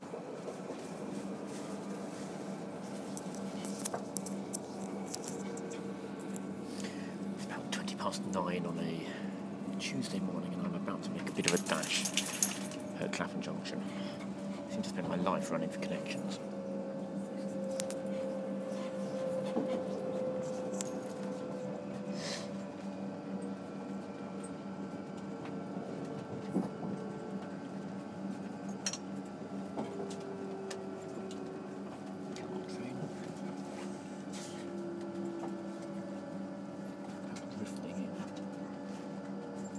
crammed train

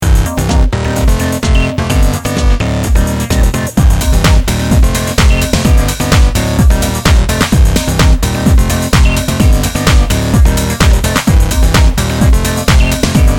detroit techno

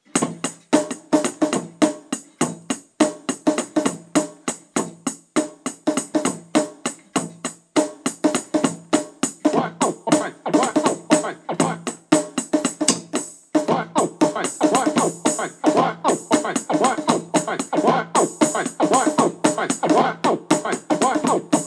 vinyl beat

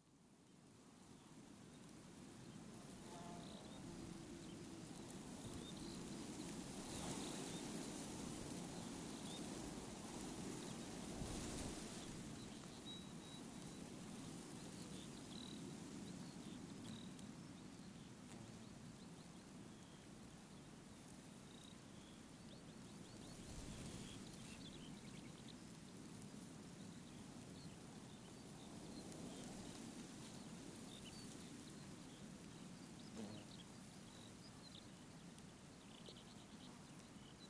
connemara national park wind

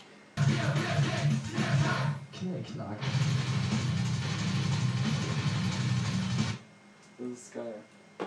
sing along

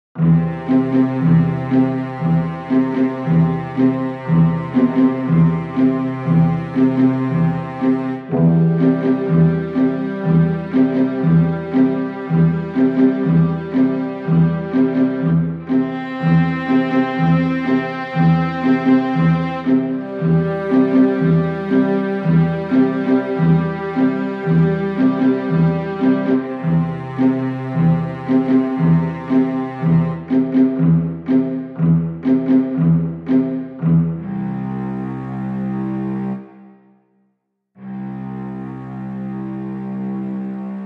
lark ascending

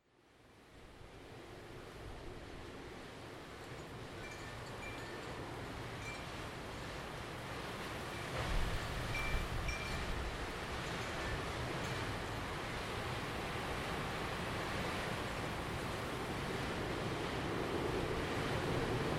soundscape windy

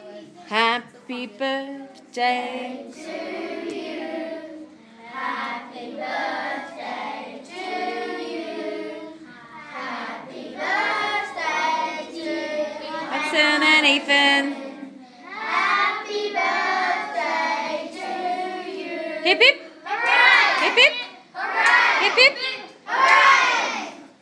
song

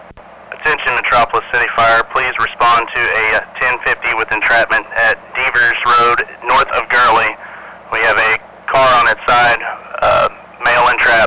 fire page; metropolis fire 2

